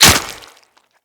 Dagger Flesh Impact; Weapons; Dual Daggers; Hit Effects

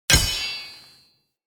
Blacksmith Hammer Strike; Blacksmithing; Friendly; Tuvalkane

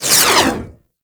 Rifle Alt Projectile By, Weapons; Warframe; Tenno; Gyre

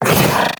Companion Melee Swipe, Pets; Warframe; Characters; Zanuka; Foley

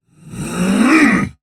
Attack Effort; Warframe; Dax Heavy; Battle Barks

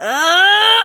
Throw, Barks; Kuva Lich; Persona B; Ability

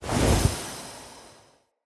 Staff Caster Cast Long, Weapons; Player; Staves; Galene

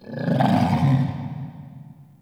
Interior Moan Gurgle; Ambience; Indoor; Warframe; Infested Eris

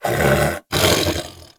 Pet Snore; Warframe; Catbrow; PetShip

